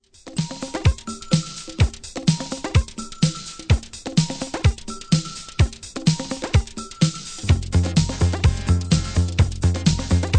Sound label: Instrumental